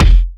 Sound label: raw bass drum sample f key; kick drum samples